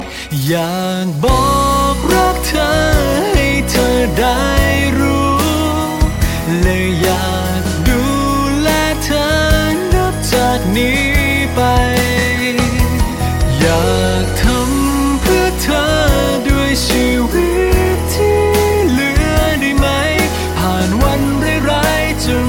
Chorus

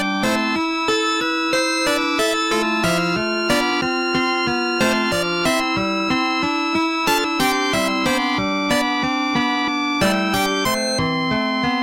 Theme 8 Bit; MGS2

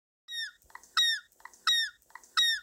Seagull; Mxr Plays

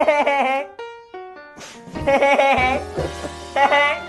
Hehehehe laugh

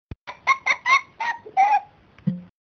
Sweet Giggle

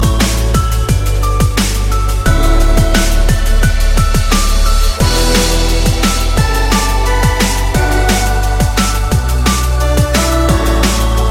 Minecraft Theme Song

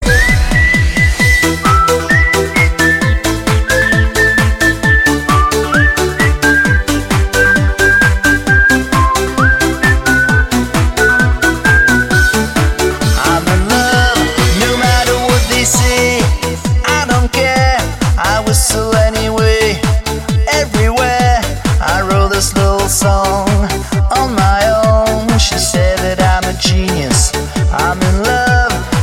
Ring Icq Icq

